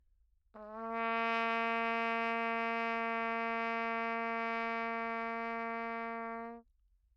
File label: low, pocket preachers cornet, Anechoic; OPENAIR